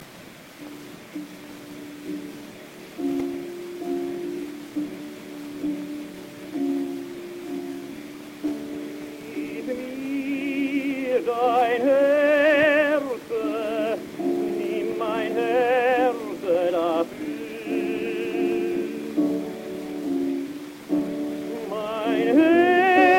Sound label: Gramophone